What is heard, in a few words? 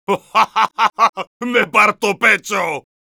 laugh long es; Heavy